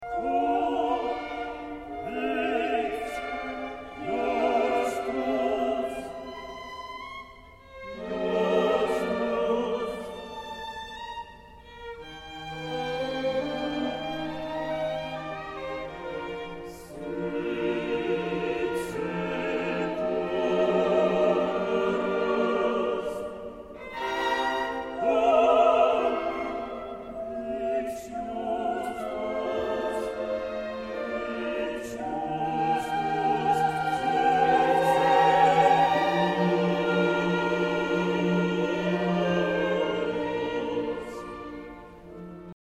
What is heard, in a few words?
Tuba mirum; III Sequenz